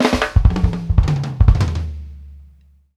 ROUND IT, FILLS 108; Sampleheads - New York City Drumworks VOL-1

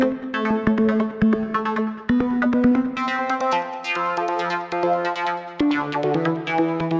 synth loop, drum n bass

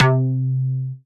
C Synth, One Shots; Electronisounds; Vibration Expedition

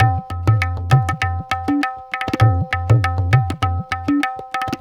Tabla

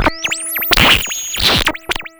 Cwejman S1 - Electric Frite

